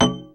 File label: METAL